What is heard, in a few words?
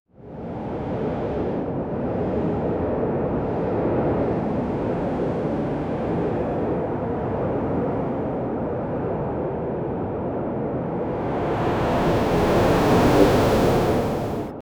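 futuristic building atmosphere; atmospheric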